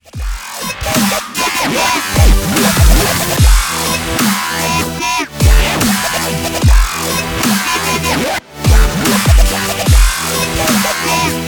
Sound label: dubstep drop; dubstep drop 2